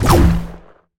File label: canon; pinball